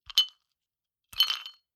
Bar Drink Ice Cubes Movements In Glass Rattle Around Clunking; Drink Mixing Sound Effects